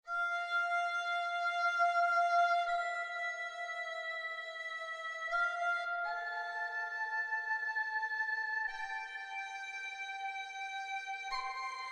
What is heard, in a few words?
Erhu; laika aged through blood windows gamerip 2023